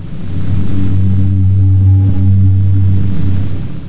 Ambient

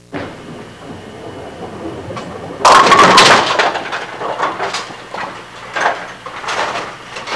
BOWL A S, SPORTS; Easy Personal Web Page

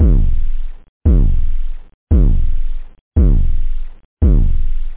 pumm, drums, technobd

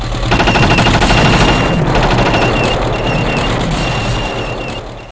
infantry; civ2; wwii

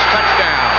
Touchdown; HyperFootball v2.00

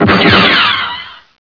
energy tan bomb; Net Power 1999 #12

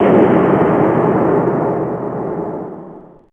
Percu07C; Alone in the Dark

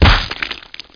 miss, zombie; Quake

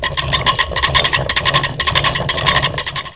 winch; PUZMANIA

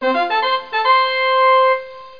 grab ball

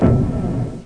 Selected Button

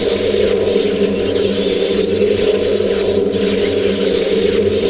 Nav Gate Active Loop

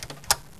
Switch Off; Scala

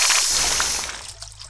splash; PC World Komputer 1998 May; rrdemo

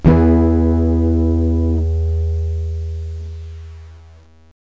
noise; Mr. Wonder's Greatest Toyshop on Earth

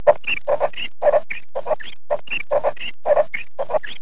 SQUEAKS, Cartoon

